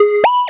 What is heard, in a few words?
KEY Hold Loop